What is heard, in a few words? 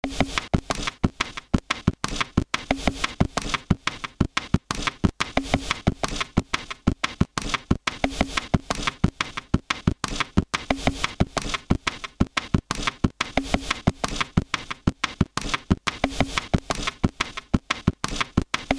drums clean